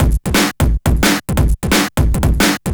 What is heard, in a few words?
K+S 175, Breaks, Drum&Bass; EZ Rollers